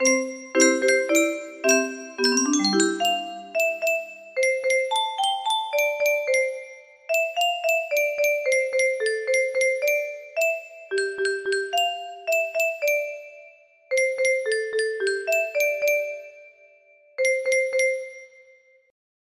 melody

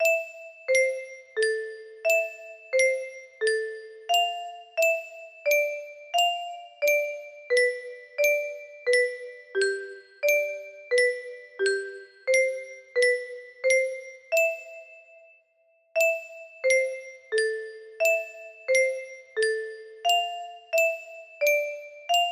melody without left hand, music box melody

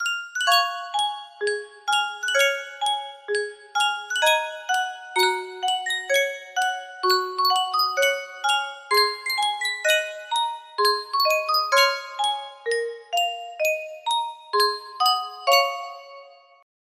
yunsheng music box, melody

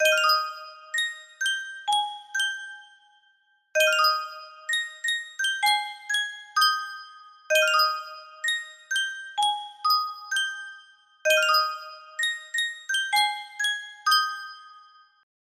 Yunsheng Music Box, music box melody; annabelles music box

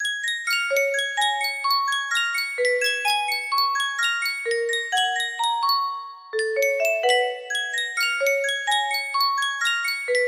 sankyo music box, music box melody

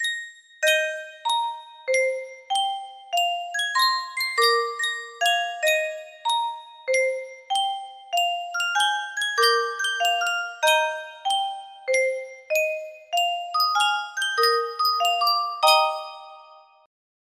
music box

